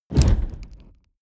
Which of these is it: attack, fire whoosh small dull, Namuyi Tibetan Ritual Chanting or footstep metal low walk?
fire whoosh small dull